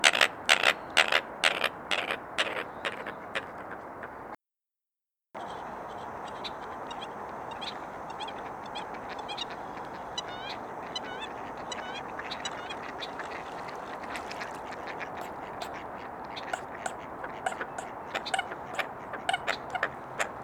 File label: American Coot Fulica americana; waterfowl